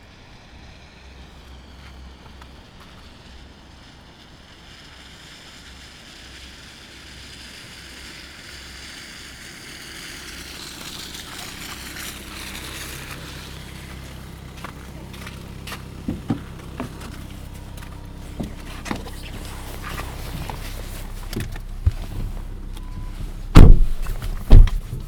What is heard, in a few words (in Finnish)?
taksi tulee